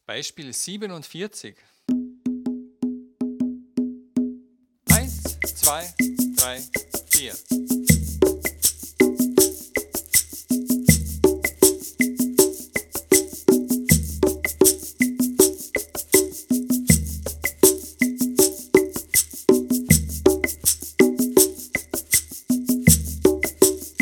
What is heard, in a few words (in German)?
viererrhythmus